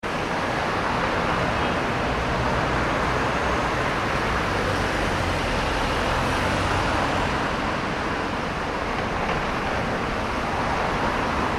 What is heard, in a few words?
ambience urban city night; City Street Ambience - London , England 10pm; Cities; Backgrounds; McKinney Sound